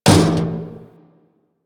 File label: Physics Barrel Impact Medium, Metal Barrel